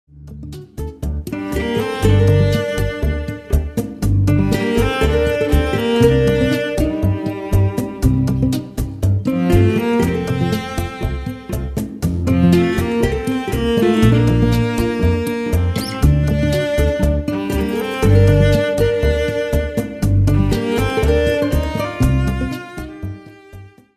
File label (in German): Latinballade, cello